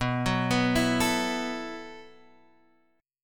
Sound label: B 7th Suspended 4th B down Guitar Half Step Down